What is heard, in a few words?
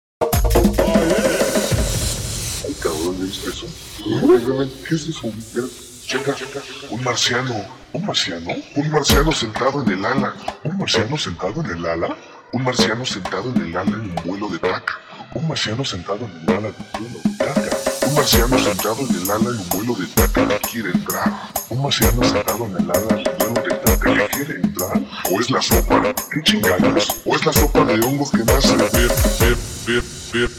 GUARACHA REMIX 130 BPM